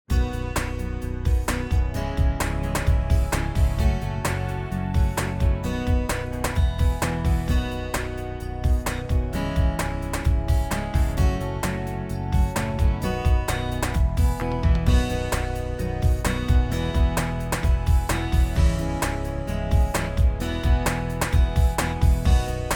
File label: 90s POP Exciting; amperAI